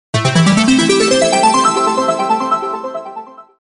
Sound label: sms ringing